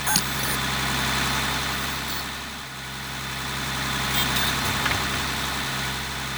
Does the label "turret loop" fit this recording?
Yes